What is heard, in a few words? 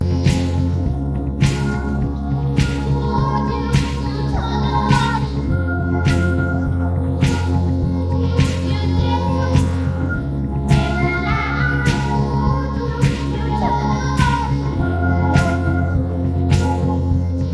instrumental